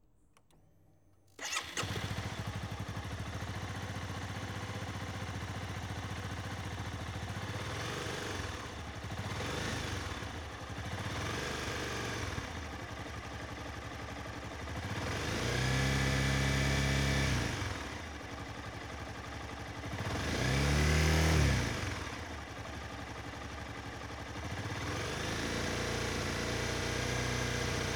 Motorcycle - Sports - Honda - Cbr 250cc - Start - Idle - Stationary Engine Revs - Various; The AudioVille; Motorcycles of India